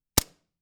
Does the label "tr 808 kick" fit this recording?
No